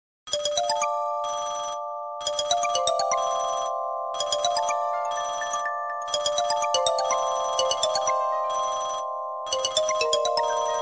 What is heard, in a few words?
heavenly nokia 3120